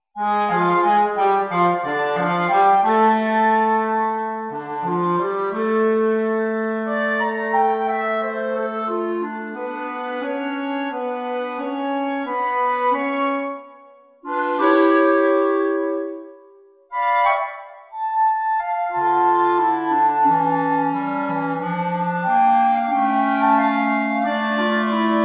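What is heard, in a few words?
trii jazz, clarinet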